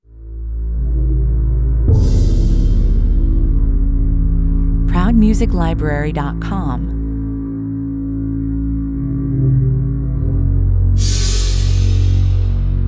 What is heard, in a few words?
horror seven 135 bpm